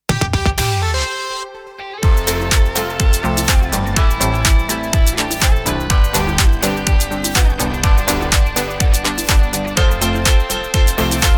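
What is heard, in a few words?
Sung Jingle 124bpm G major; Giant Radio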